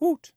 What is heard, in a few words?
Zombie